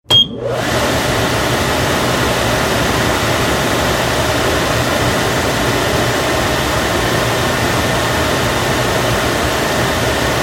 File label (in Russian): shum vytjazhki